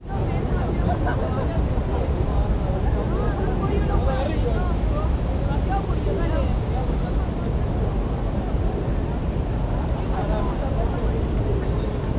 ambience city london uk parliament square garden foreign students chatter traffic; 12 15pm; big ben